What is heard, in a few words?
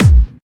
BD Clubby; VEC1